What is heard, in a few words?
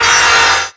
whirling death impact; weapons; dota; shredder